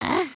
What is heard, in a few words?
need to pee; emotes